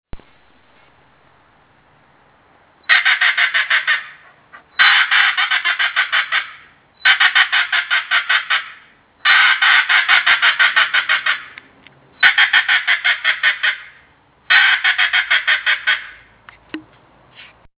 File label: Teal Call